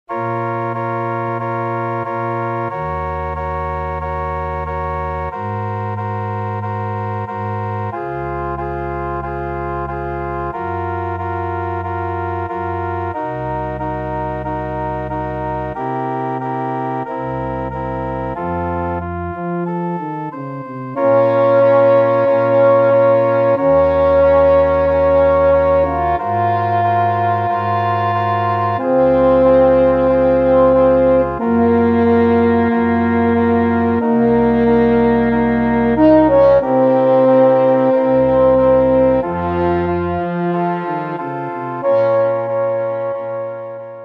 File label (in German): Horn Solo; Ave Maria